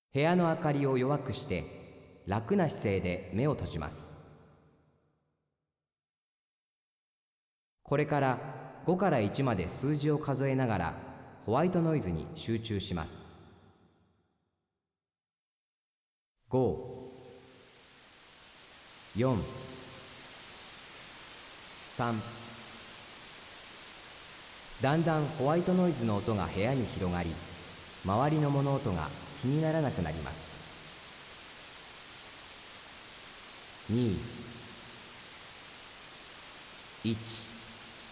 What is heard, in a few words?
whitenoise